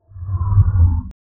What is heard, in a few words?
monster, creature